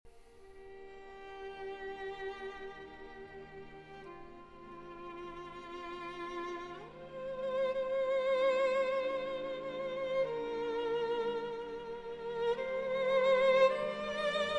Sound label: Violin Concerto in G Minor, Adagio